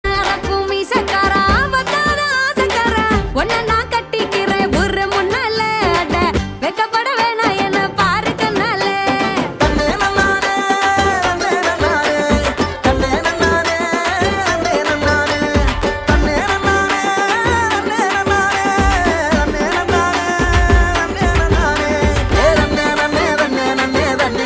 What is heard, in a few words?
Female Ringtone